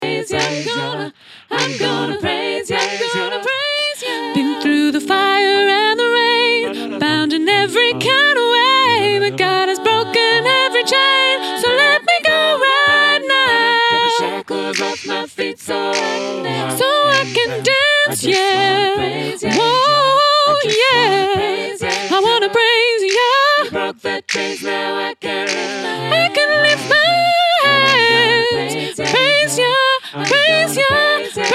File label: sop solo